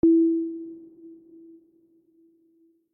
check off; (SK) Mathi 1.0 - DT

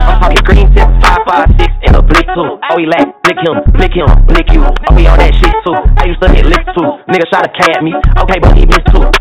applause; tekkito aleph edit

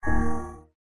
menuback; Replicant

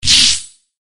combo break; Hidoi Black Souls

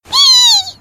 combobreak; The Legendary Minalinsky Minami Kotori

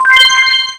match confirm; saiyku [rby] instafade

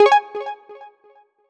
match confirm; Minimal Weeb - Noel v1.3

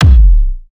drum hitclap

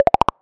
menu hit

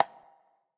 menu back; [EZHD] BlooMoon

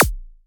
soft hit clap; [SPEED] Oka Ultimate khzAzerite

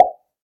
normal hit normal; • adidas for3v3r

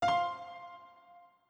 pause retry click

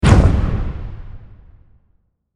fail sound; O2Jam+UUltimate

